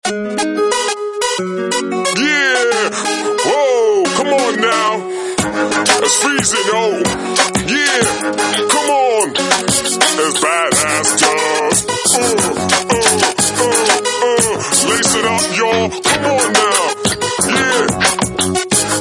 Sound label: Hip hop Guy; Samsung GT-M3510 Beatb